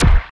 Zappy Kick; Sonic Foundry (Sony Creative Software); Esoterik Beatz; Zappy Kit

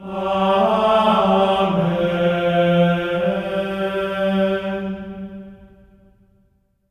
AMEN G4, Symphony of Voices; Spectrasonics; GR AMEN

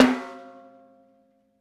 Pork Pie Snare; Essential Percussion

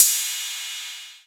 Cymbal, drum machine

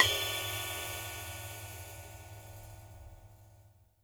SIDE ON SIZZ, cymbals; Sampleheads - New York City Drumworks VOL-1; SW CYMBALS

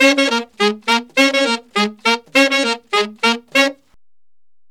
Sax Section in Db, Jazz; Sonic Foundry (Sony Creative Software); Jazz Solos and Sections

